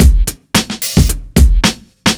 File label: Beat 110bpm; musicradar